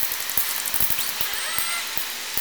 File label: call, Orca; SRKW; Alwin; S18